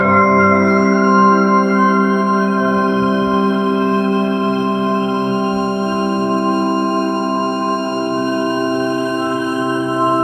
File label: ambient soundscape; ai